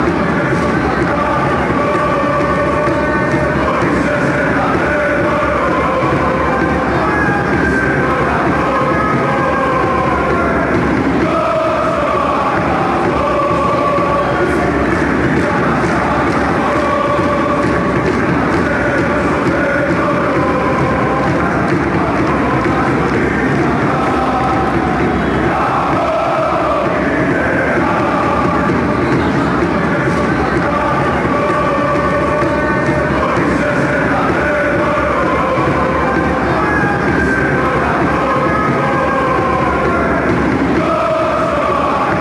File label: fanchants, greece; gia auto kai ego tha tragoudo